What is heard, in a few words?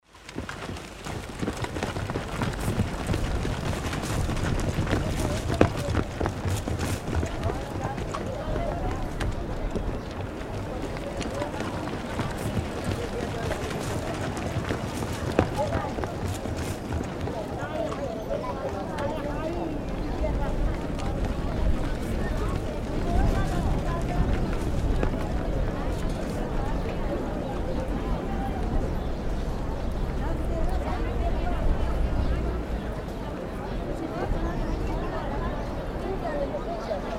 Ambient